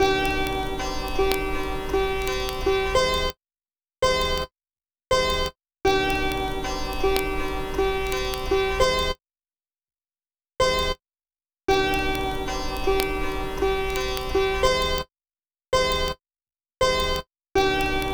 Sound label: Melody, Hip-Hop